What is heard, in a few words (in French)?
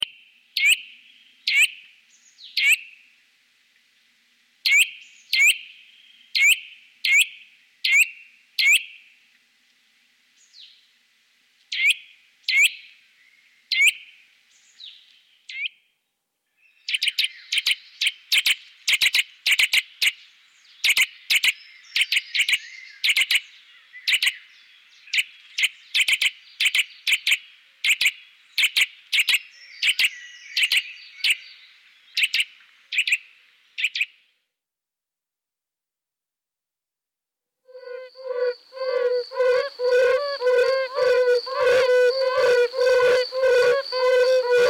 sitelle torchepot sitta europaea